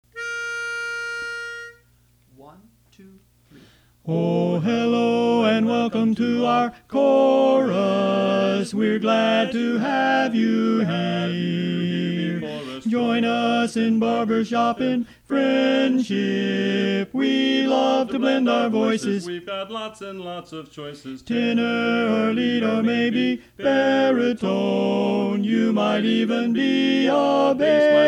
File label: Welcome Lead; learning tracks